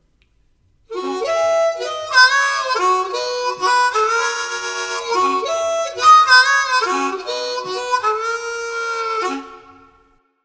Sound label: blues hall